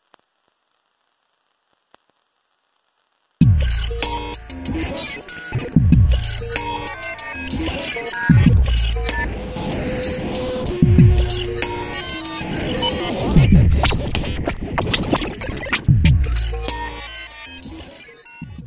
Roland V-Synth Dusted Loop; Factory Patches

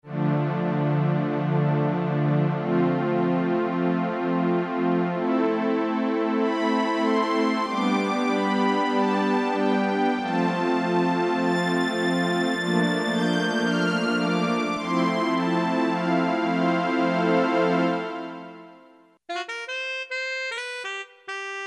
Voices, Yamaha S5502 Drums

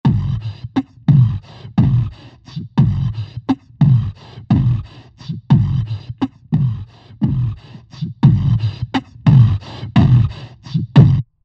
Blow Hard, Roland SR-JV80-12 Hip Hop Collection

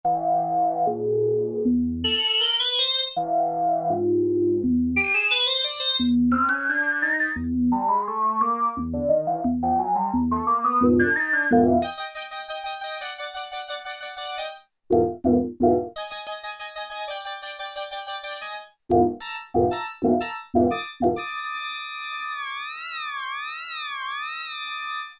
Synthe 4; Factory Presets Bank B

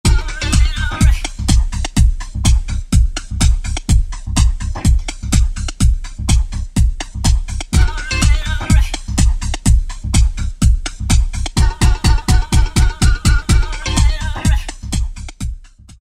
125 GrvyBaby, House Collection; Roland SR-JV80-19 House Collection

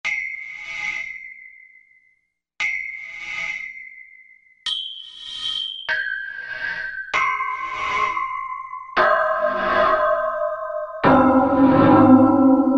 Korg M1 Pole

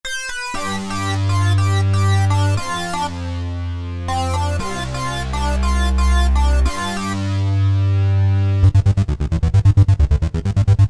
LEAD & SEQ, Roland SC-880; Factory Patches

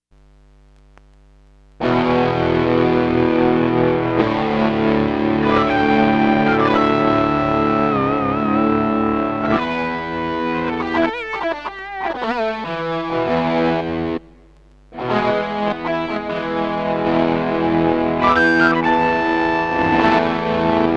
LO-FI GND NZ, Roland SC-880; Factory Patches

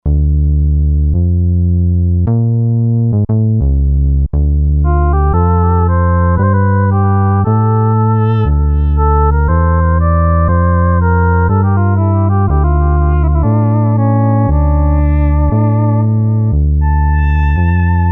Roland JV-2080 SH Split

